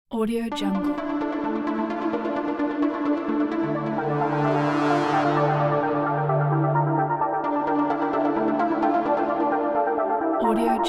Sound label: psychological thriller intro logo